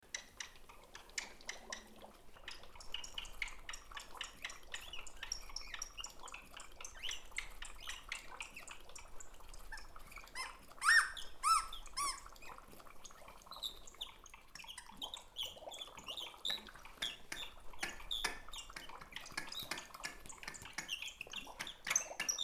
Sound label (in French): paysage sonore; PROJET ÉDUCATION MUSICALE EN MATERNELLE ET ÉLÉMENTAIRE